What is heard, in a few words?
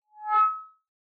Megaphone Feedback Dry